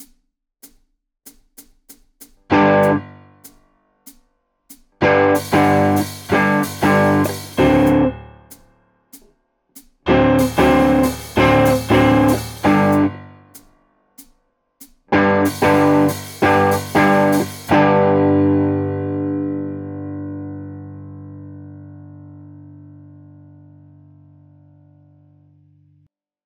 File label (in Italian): BASE BLUES